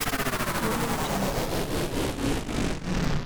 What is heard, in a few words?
scifi machine spin down